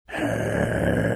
growling

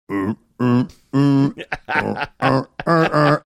doing an impression of playing tuba

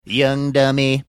singing young dummy

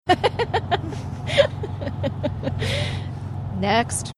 laughing saying next

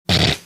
snorting

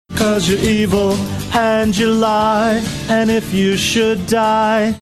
singing cause youre evil and you lie

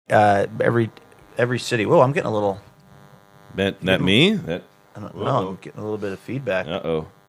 feedback ghost in the machine

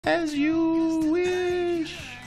singing as you wish

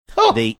"huh"

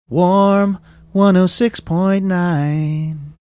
singing

